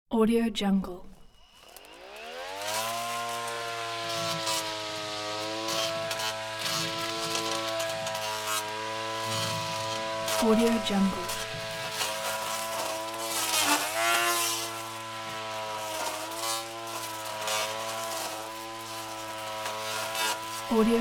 cutting undergrowth with blade mower; industrial sounds; بریدن شاخه های ریز

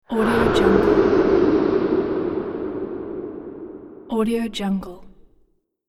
haunted whoosh; transitions and movement

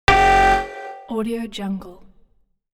short warning alarm; industrial sounds

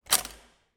gun shot sweetener stereo; مسلح کردن تفنگ

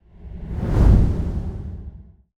whoosh the end; transitions and movement

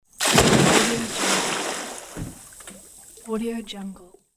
pool water splash; شیرجه زدن در آب

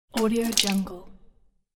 spitting water to sink

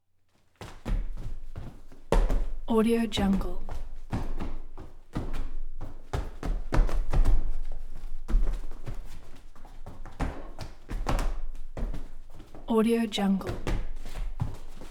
sports basketball sports interior playing basket; human sounds; بازی بسکتبال در سالن

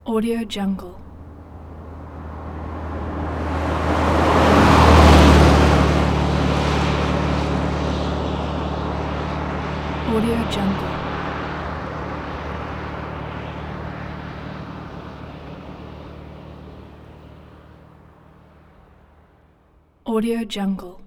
bug pass by long away medium speed; v w bug; عبور ماشین در جاده; industrial sounds

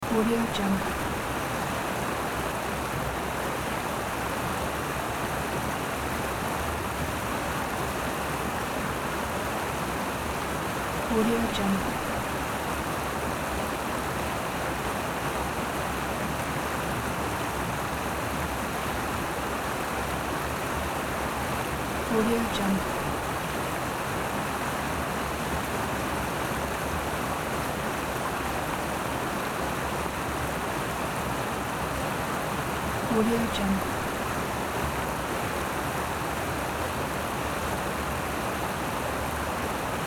river mountain water stream small; 10m; جریان آب رودخانه

